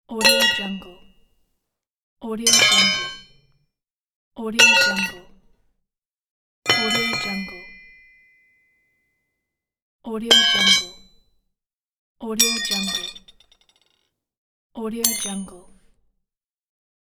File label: metal drop